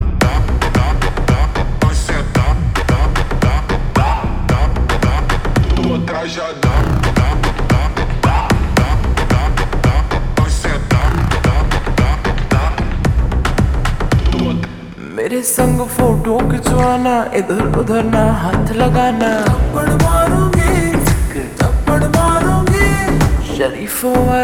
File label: Slow Reverb Version Ringtone; pushpa 2